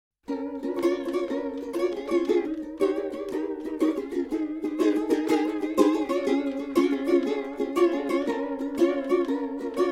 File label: vibrato